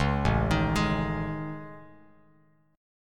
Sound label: A Major Sharp 11th, Bass Standard; AM#11; arpeggiated; JGuitar